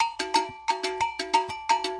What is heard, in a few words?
090 bpm 3-4 cowbells